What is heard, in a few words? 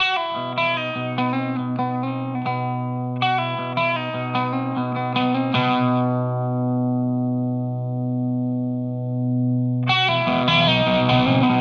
Walrus Audio Ages Mode 4 Volume